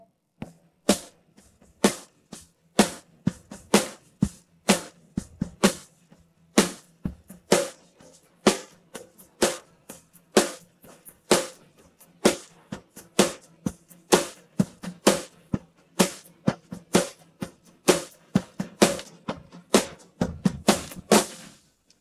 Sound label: drums pattern